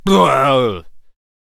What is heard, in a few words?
Gunslinger Death Vocals